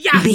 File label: voice male attack